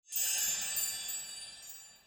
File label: gem fade; netent